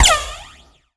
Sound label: bullet reflect